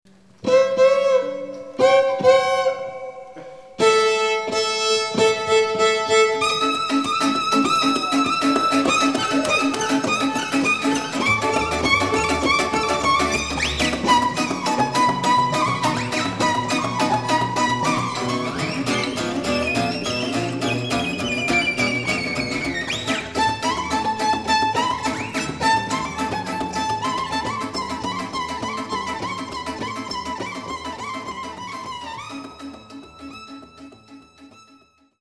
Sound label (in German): instrumental